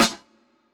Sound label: Snare, trap drum kit